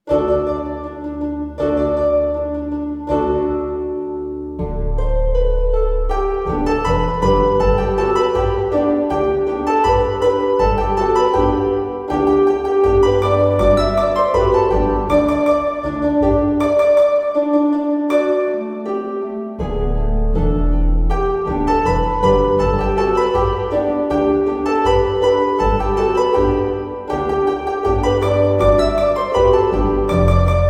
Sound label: Harp; Alouette